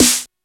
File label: RAVE